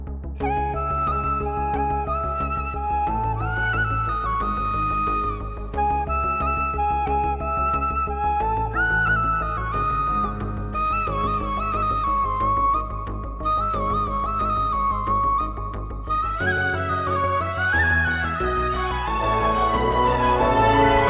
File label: FLUTE RINGTONE